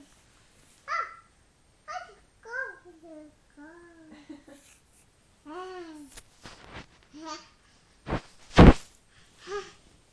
babbling

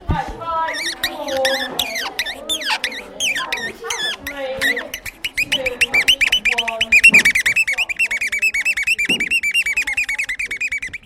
pen sqeaking

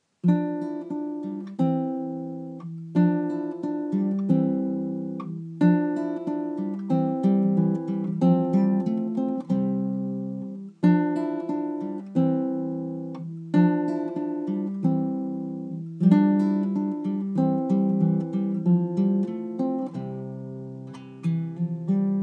acoustic guitar

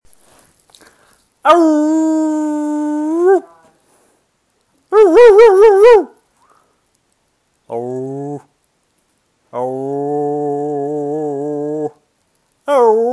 dog

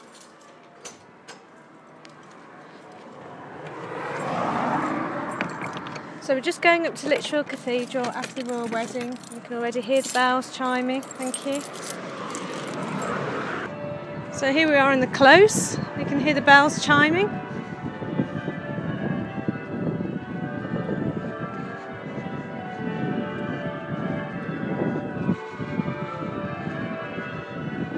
royal wedding bells

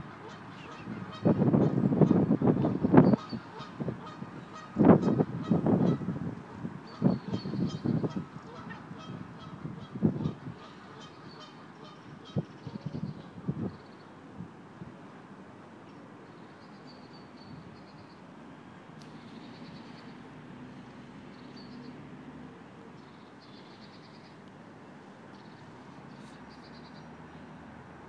geese herons other birds

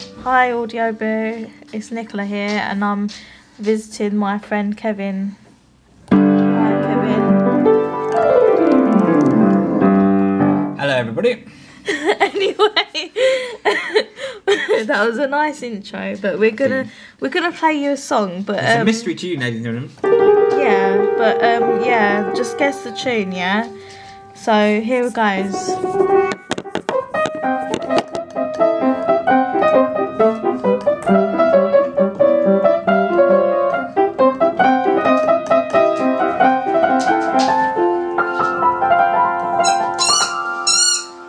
drunken piano

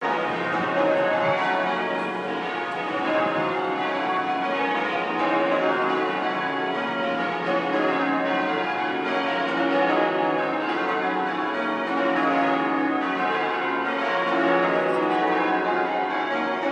church bells ringing at Sheffield Cathedral

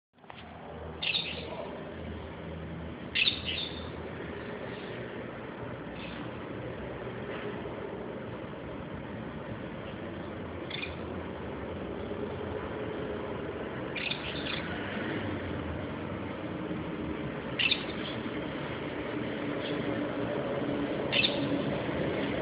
parrots and buses outside my house

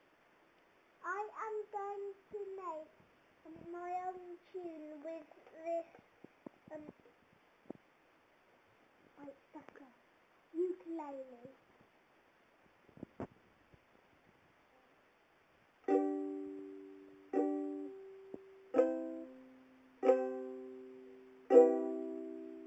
new instrument first try

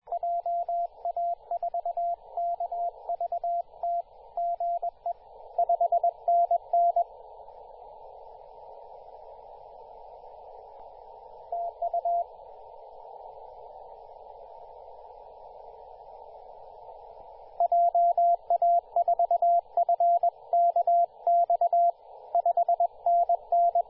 cw, 18.071mhz

